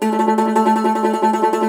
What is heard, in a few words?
Cumbus Saz